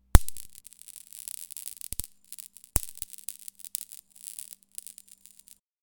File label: Electric Shock